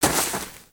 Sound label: Footstep; Lego; Strombie; creatures organic